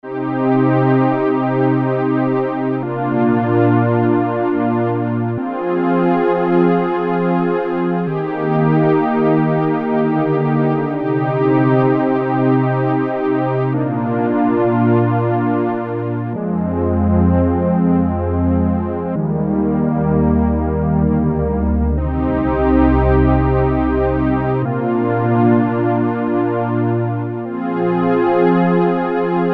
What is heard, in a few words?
String, Moog MemoryMoog; Factory programs